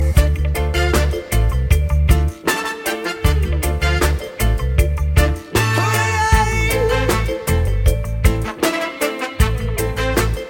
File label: reggae